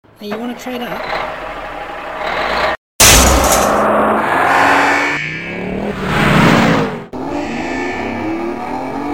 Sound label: Truck; Behind Truck